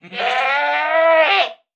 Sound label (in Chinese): 山羊尖叫冲撞前声